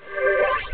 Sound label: blip